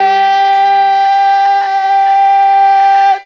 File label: "aye", Voice